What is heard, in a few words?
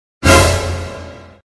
Suspense chord; NOSFERATU SOUND EFFECTS